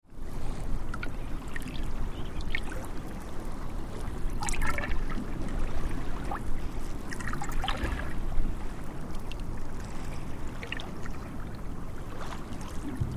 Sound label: Ocean Lapping on Wooden Hull, light water close shot lap